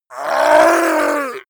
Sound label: goblin scream pain; schreie